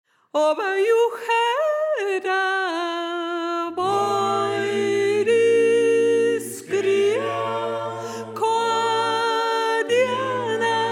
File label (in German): Sopran